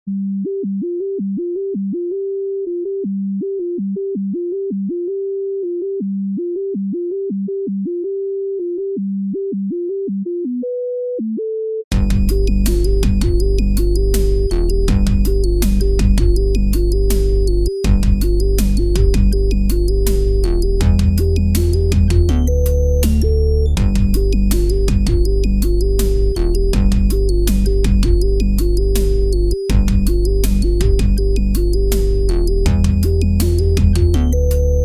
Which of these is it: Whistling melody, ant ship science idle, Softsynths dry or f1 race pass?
Softsynths dry